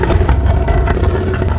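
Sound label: wood move; quakemapping; mod quoth; necros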